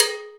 PRC COW, Cowbells; Roland - Rhythm Section; Latin 1

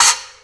Choke Splash One-Shot, cymbal; KJ Sawka - Drum n Bass & Beyond; Drum Tool Box

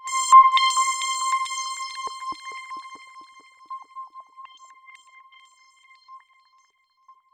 C5, SynthLine; 90 s Sample CDs; NiceBeats; SynthLine VOL-10

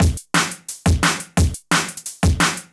Breaks, 175; Drum&Bass; EZ Rollers

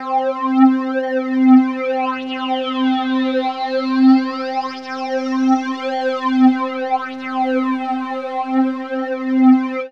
synth, Synth Atmos (pad); ambient; Chillout

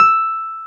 PNO Wurly; Keyboards of The 60's and 70's

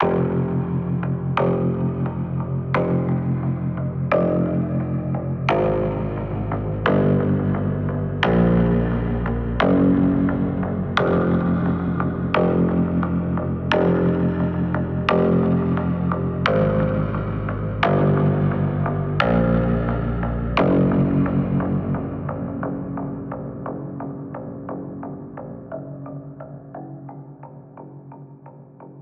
Lead; neuro; InsideInfo

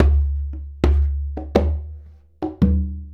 Congas; Essential Percussion

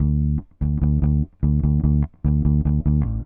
Bass, Indie Rock

